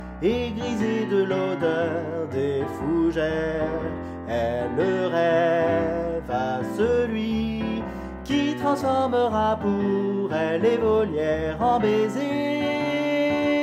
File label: Soprano